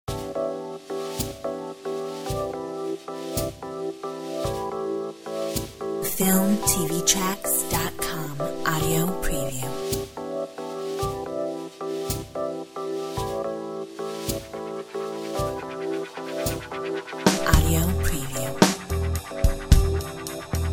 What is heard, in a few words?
Asian Lounge